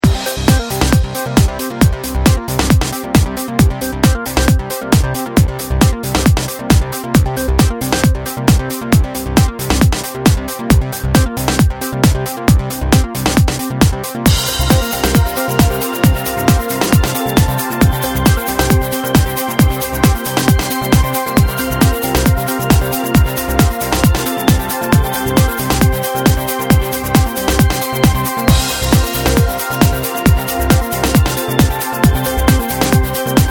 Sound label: 135 BPM